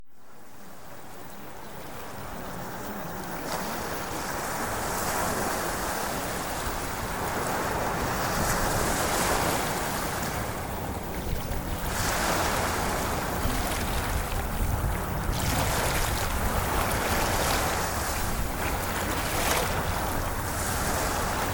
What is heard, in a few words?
Ocean Waves